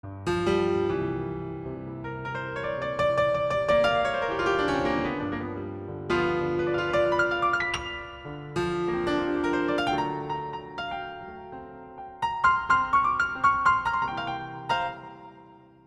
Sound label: grand Piano